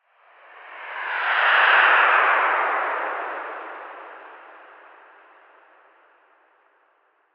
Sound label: Space Ship Pass By; spaceship fx